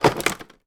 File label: household vacuum cleaner upright put down on floor; sound effects one